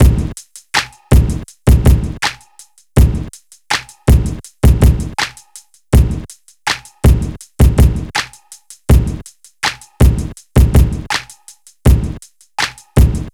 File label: drums, Smooth RnB; Big Fish Audio; Legendary; Happiness